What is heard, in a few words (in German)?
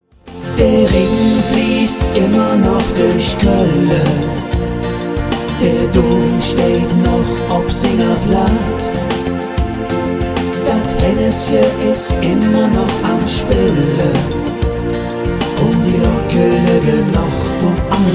Rhing Refrain